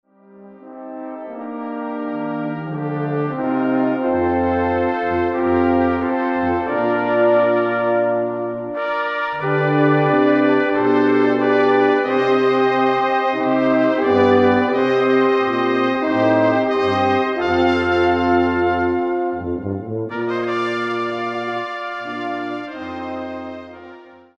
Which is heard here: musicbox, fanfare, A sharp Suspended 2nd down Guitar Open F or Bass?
fanfare